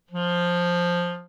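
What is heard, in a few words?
clarinet pitch; ANGUS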